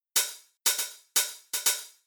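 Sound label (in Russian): open hat, Ритмическая фигура открытого «хэта» 3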